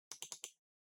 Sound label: PC Mouse Far L Double